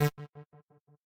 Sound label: synth